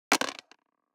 DICE on Plastic, Throw and Roll, Metal, 2 Two Dice; Samples; ArticulatedSounds; DICE Sfx Party (Extended)